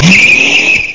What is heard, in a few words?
whee; High Octane; Fry-Up Productions